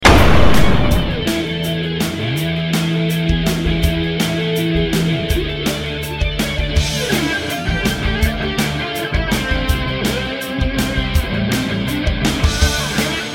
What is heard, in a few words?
guitar spot